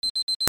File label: ALARMS